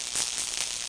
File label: ELEC